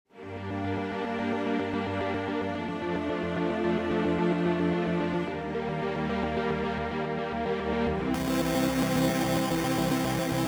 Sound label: Strings Full Ensemble Sustained Step Mod Panorama Lo Fi